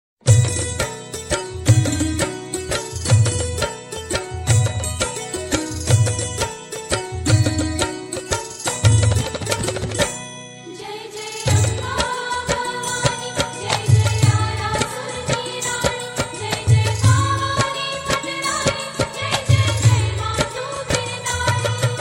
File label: Garba Tone